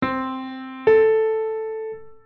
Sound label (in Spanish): sexta mayor ascendente; sextas